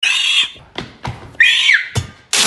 Reee

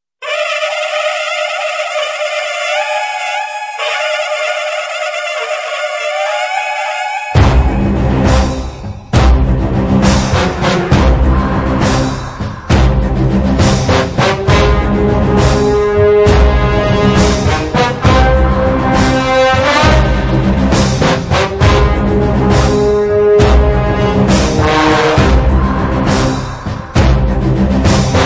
Violent Tone

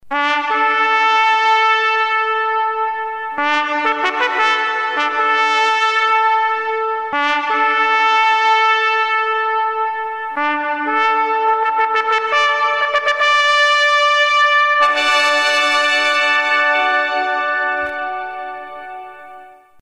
Trumpet wake up

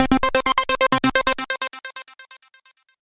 Arp Bass; Demon

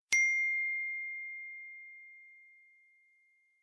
I phone notification

